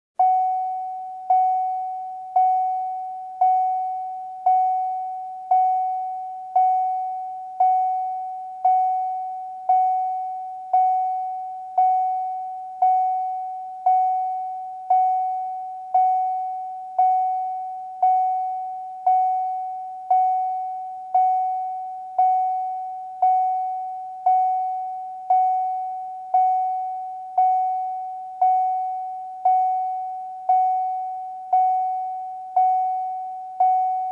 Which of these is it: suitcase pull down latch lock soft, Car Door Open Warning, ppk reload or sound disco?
Car Door Open Warning